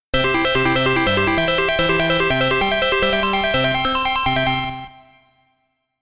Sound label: Game Over BGM